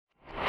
reverse; Sifu; Music Hideout4

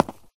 footstep concrete land; MONO; ProSoundCollection v1 3

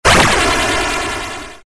Player nuke

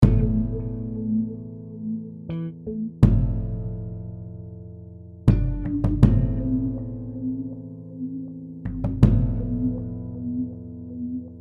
Game Over; Diehard Dungeon; PC indie game

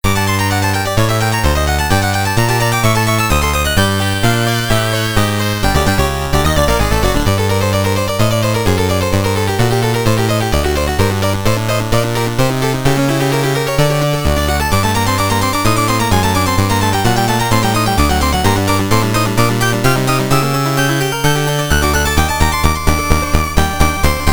Clockwork (時計台ステージ); Akumajo Densetsu sound tracks

